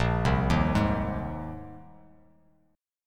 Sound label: Bb 7th Suspended 2nd Suspended 4th Bb, Bass Standard; Bb7sus2sus4; arpeggiated; JGuitar